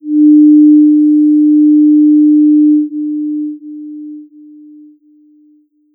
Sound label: foghorn echo; West Chop; woods hole